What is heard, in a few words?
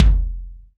wet kickdrum sound g key